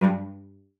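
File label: G2, cello spiccato; Xsample VOL-5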